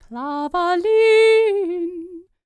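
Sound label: Scandinavian, World Voices; ILIO; Vocal Planet VOL-5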